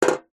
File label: industrial ball bearing drop on hollow thin metal; zapsplat